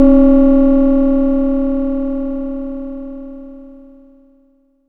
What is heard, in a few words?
Sci fi